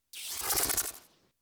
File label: fluttery static pass by